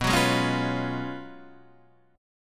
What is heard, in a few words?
Gb Major 7th Suspended 2nd Suspended 4th